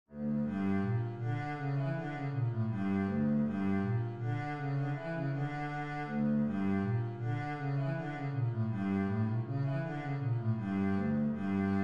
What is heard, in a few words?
hasapikos bass